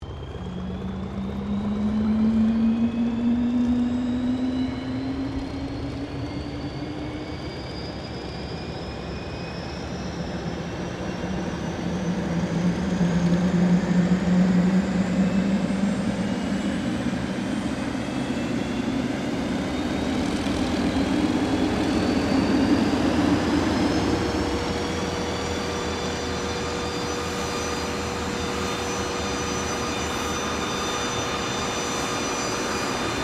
AIRCRAFT CESSNA CITATION CJ3 STARTUP; Airplanes

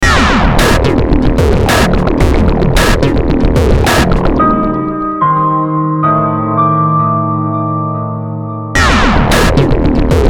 BEAT PIANO CHO, Beat Piano Choir Industrial; Miscellaneous Loops

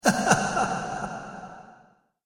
Ha Ha Ha Laughing With Reverb; Laughter Sound Effects

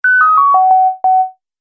Multimedia Music; Miscellaneous Musical Sound Effects

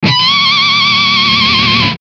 Musical Guitar, Guitar Squeal Tremolo; Blastwave

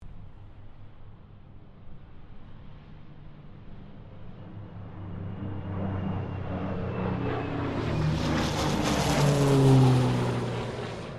PC-9A squadron 5 takeoff, Roulettes air display; Airplanes; Vehicles

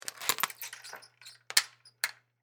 Foley, Door, Unlock, Slide, Chain, Hotel, Door; Miscellaneous Door

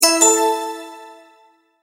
multimedia tone verified

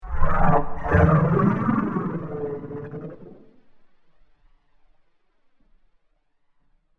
Space Engine Ambience; Sci Fi Music; science fiction sound effect; Space Engine Ambience Fx; star wars / trek style games

